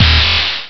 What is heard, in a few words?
scifi